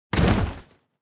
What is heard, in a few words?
Motion Fall Single